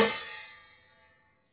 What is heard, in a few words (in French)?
cloche res